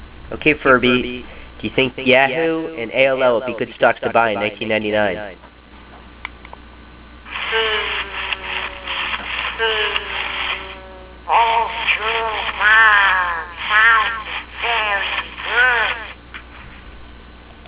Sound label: yahoo; Furby answers the question, "Is yahoo a good investment?"